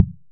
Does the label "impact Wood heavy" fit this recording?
Yes